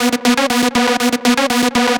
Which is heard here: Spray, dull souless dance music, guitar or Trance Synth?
Trance Synth